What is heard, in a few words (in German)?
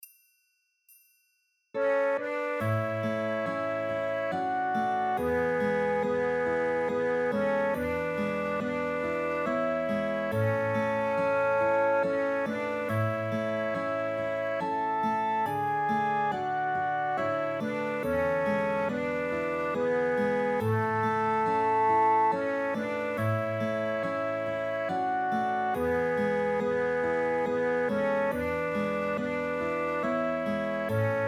schneefloeckchen playalong